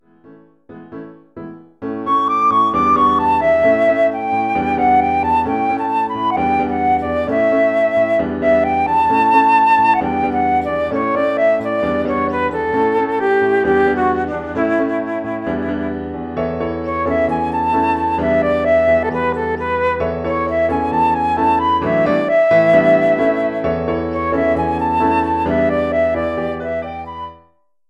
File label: RUMBA flute solo